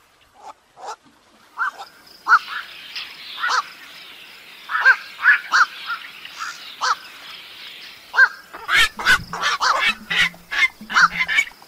Tieng chim Vac keu

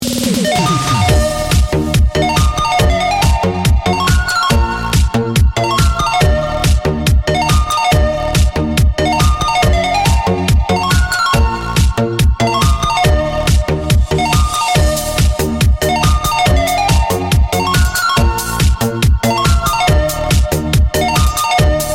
Nhac chuong remix

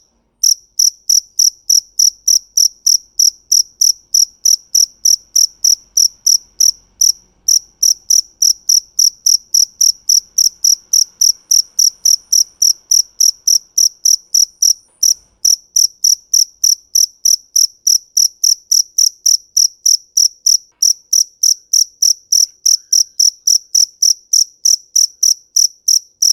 Tieng De keu

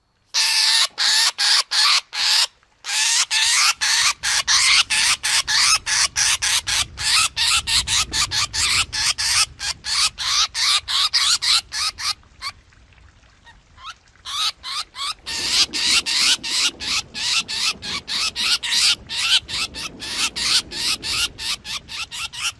Tieng chim Cheo Beo goi bay

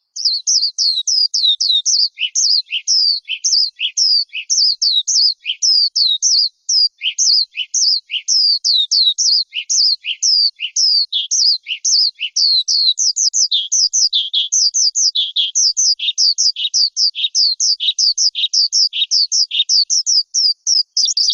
Tieng chim Son Ca hot

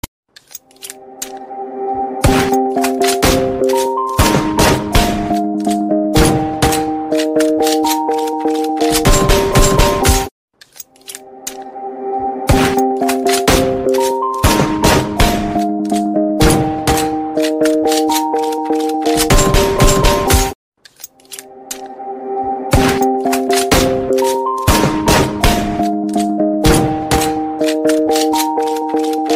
Nhạc bắn súng TikTok

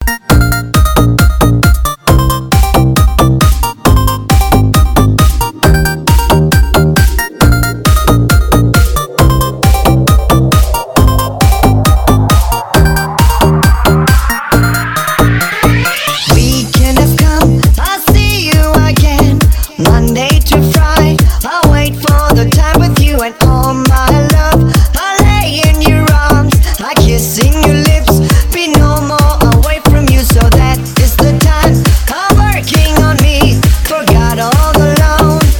Nhac chuong DJ hay

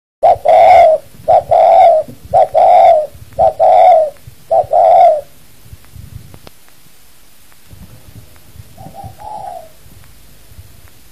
Tieng Cu Gay Gu

